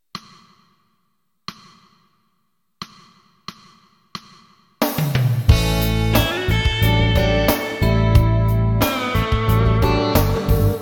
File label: Cool melody